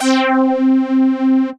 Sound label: Puffy Bass Lead, analog synth lead; Puffy K Bass Lead; Ableton; Factory Packs; Synth Essentials